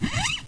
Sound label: zip; Mobiclic46